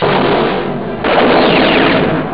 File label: explosion; Amiga Soundtracker sample